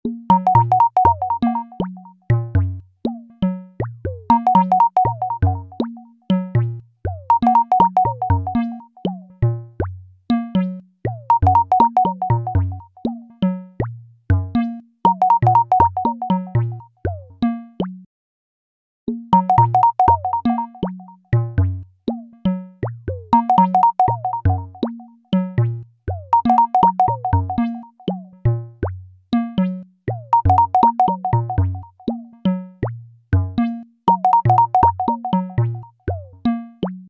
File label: Bubble, ringtones; LG V30 Plus